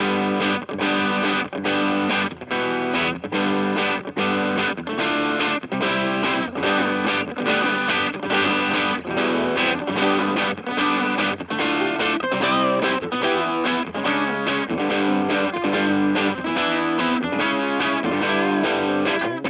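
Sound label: Hagstrom Crunch Bridge, guitar; Pat Smear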